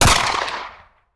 Rifle; Sound Ideas; Guns

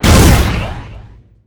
Gun Assault Rifle Power Shot, Weapons; SFX Kit

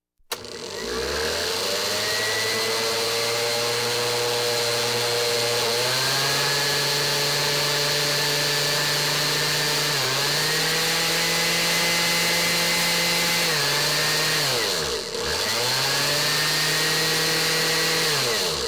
Household Phones

